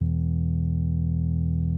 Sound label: organ